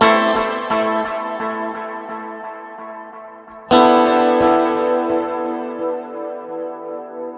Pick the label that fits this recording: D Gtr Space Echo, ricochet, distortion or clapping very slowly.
D Gtr Space Echo